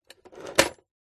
household telephone old fashioned rotary style set down